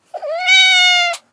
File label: Kitten Meow; SES Animals SFX